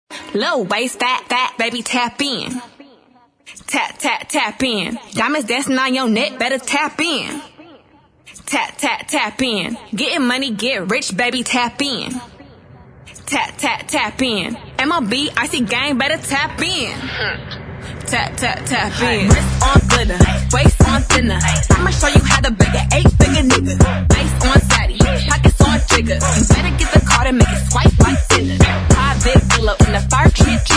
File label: Acap